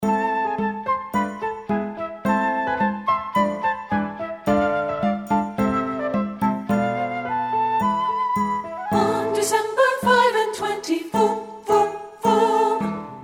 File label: ALTO; satb